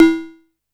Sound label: Cowbell Rook; One Shots; Drums; Fenk; Ableton Live 12 Lite; Core Library